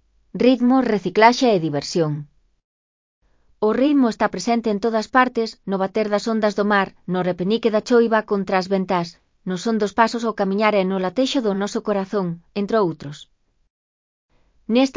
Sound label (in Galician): RITMO RECICLAXE E DIVERSION; batucada